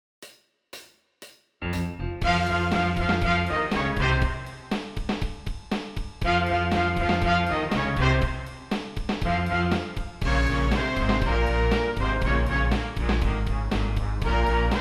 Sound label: Rock On Full Band